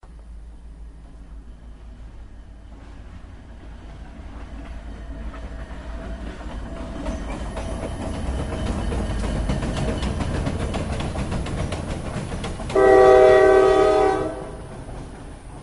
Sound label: Deisel Locomotive Moving Engine Only Whistle Blast Once